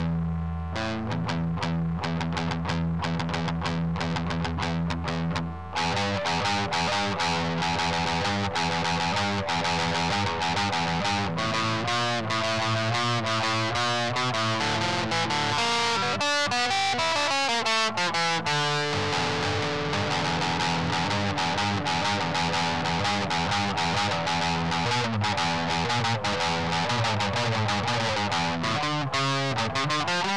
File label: Hot Solo; Alskim